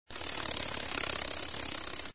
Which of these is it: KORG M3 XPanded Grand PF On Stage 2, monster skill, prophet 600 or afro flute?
monster skill